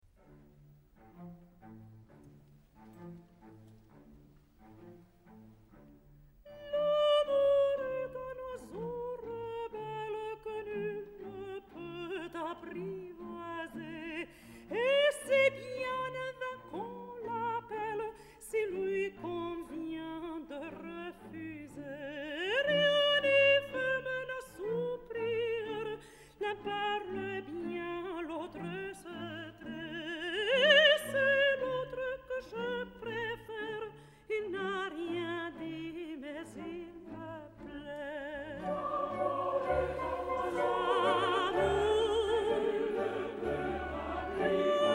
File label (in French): habanera voix; CARMEN